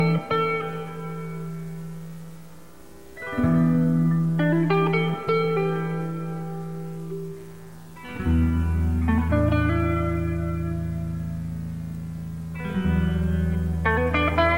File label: Blues, ringtone